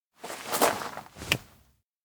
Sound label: crouch pray; anemoi